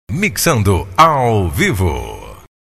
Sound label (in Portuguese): mixando ao vivo